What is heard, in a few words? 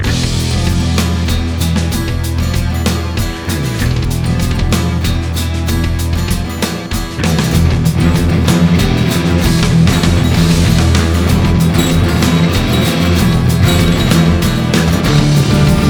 Rave